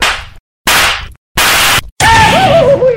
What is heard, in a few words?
juicy slap; Memes